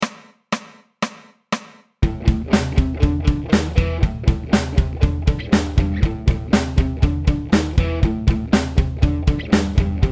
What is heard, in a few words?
pick groove, bass playalongs